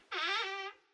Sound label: creak; Farm